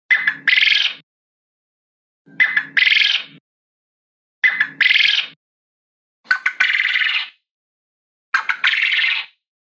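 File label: Perepel, quail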